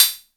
Hat Closed, drum kit; Polyend; Stazma & Concrete Collage

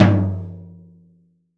TOM, CTD TOM MLT

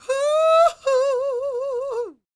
CROON, Soul

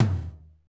tom R&B, Roland; Northstar - Drumscapes Roland; Funk

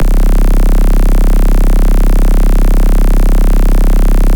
Huge Melodic Dubstep Bass

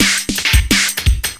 LOOP SD, Drum & Bass, 170 BPM

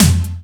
ELECT SD; USB Soundscan vol.27; Ragga & Dub Reggae; AKAI

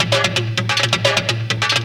percussion, 130, drum loops

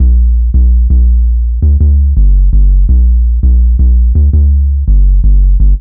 bass loop 165bpm A#1, Drum&Bass; Total Drum&Bass

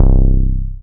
BAS MKS-50, Roland

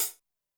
closed hihat, Zoom MRT-3B

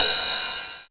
Ride, Rides; Electronisounds

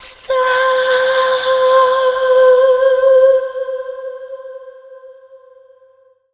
Dance Vocals, FEMALE

